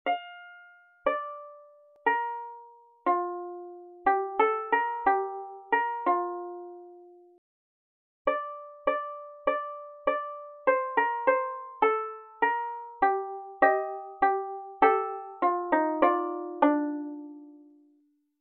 a computational violin plucks, virtual violin produces realistic sounds; MIT engineers